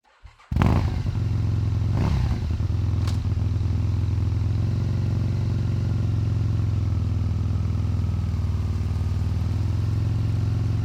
Aprilia SL1000 Falco ONBOARD Dyno Turn On Start Various Constant RPM Rev Down Stop, V-twin 997cc; 2001; EXHAUST; Dramatic Cat